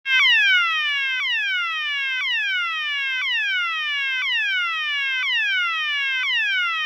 tón č. 8, opticko-akustická signalizácia; LED opticko-akustická signalizácia A-MLOAS 24V AC/DC

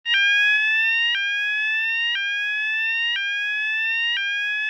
tón č. 3, opticko-akustická signalizácia; LED opticko-akustická signalizácia A-MLOAS 24V AC/DC

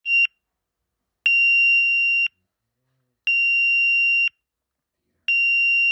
tón č. 12, opticko-akustická signalizácia; LED opticko-akustická signalizácia A-MLOAS 24V AC/DC

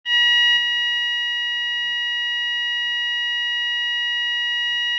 tón č. 14, opticko-akustická signalizácia; LED opticko-akustická signalizácia A-MLOAS 24V AC/DC

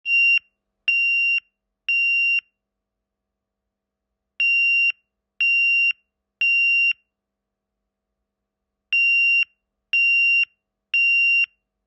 tón č. 26, opticko-akustická signalizácia; LED opticko-akustická signalizácia A-MLOAS 24V AC/DC

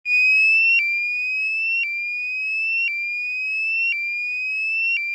tón č. 6, opticko-akustická signalizácia; LED opticko-akustická signalizácia A-MLOAS 24V AC/DC

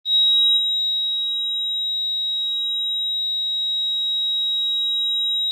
tón č. 27, opticko-akustická signalizácia; LED opticko-akustická signalizácia A-MLOAS 24V AC/DC

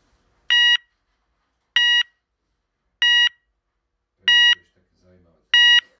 tón č. 13, opticko-akustická signalizácia; LED opticko-akustická signalizácia A-MLOAS 24V AC/DC

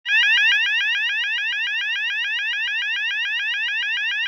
tón č. 2, opticko-akustická signalizácia; LED opticko-akustická signalizácia A-MLOAS 24V AC/DC

